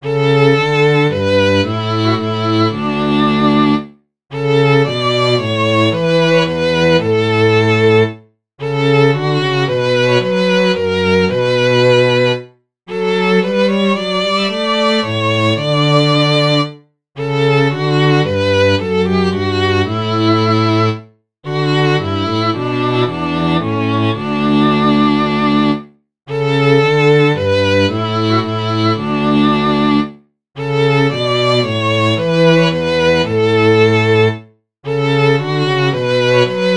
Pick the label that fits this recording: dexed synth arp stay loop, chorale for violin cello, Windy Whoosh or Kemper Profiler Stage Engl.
chorale for violin cello